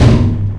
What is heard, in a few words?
menu; quake2; coop